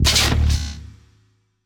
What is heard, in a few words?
Impact - Dark Bass